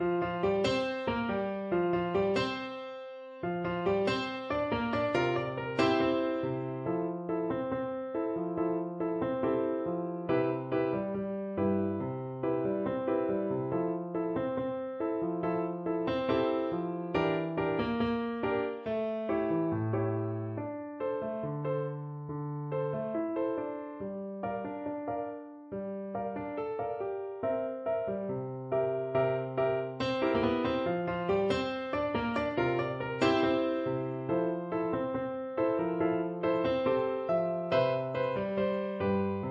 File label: FL kar, Flute